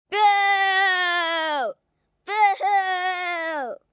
Booo Boohoo, Boo, 1 girl, 9 yrs, Booing; VoiceBox

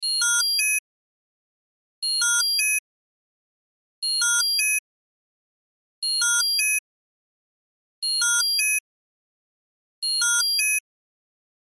Cell Alarm Low, Cell Alarm Low Battery Chime Loop; Alarms; Electronics; Blastwave

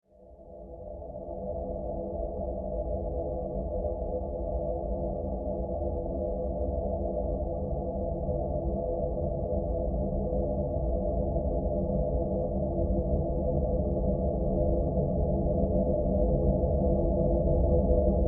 Horror Drone Airy Build; Evil Sound Effects; Evil (Sound Effects:Horror); SFX Bible 6

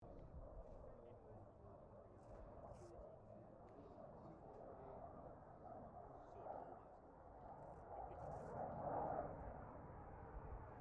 AIRCRAFT CA-27 SABRE PASS STEREO

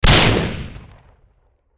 Grenade Explosion Debris Blast; Grenades; Explosions; Warfare; Blastwave

